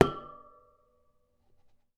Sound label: Metal